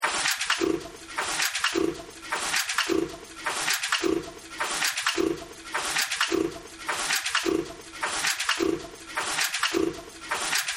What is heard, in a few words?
Factory